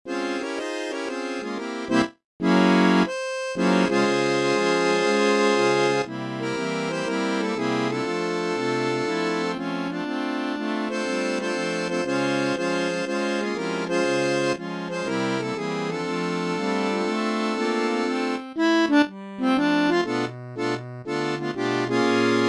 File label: harmonica octet, Synthesized audio for study